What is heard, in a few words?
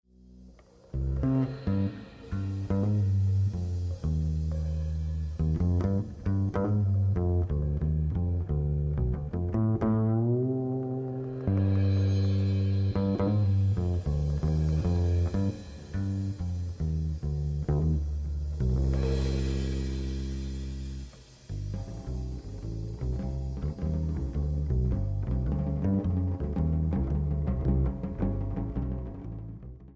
Folkish